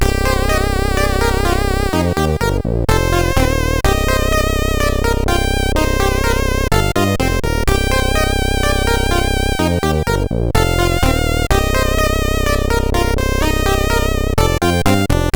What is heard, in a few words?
Game Over, CSG8580; SID; CSG8580R5